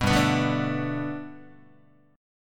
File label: G Major Add 9th Guitar, chord; Gadd9 strummed; JGuitar